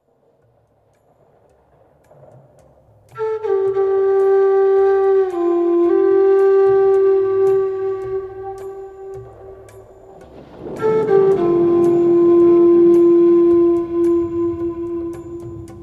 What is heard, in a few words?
Healing Thunder Tones, Relaxing Native American Flute Music